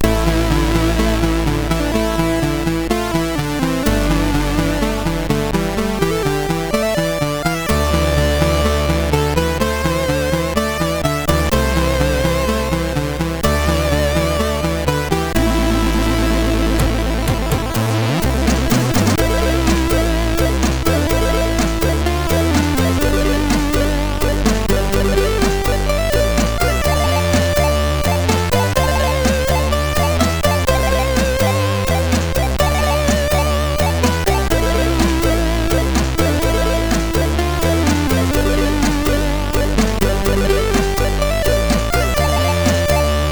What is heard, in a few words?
intro, sid; MOS6581R3; hvsc